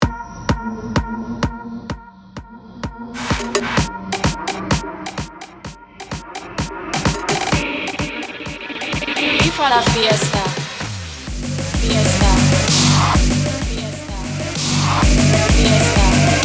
House Bottleg Mashup 128BPM

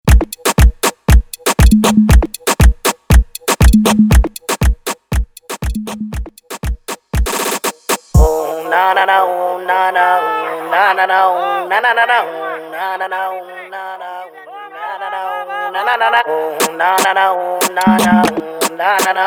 Intro Outro 119Bpm

